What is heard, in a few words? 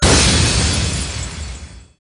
gem shatters